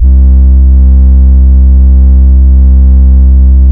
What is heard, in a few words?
Sub Death 808; 808s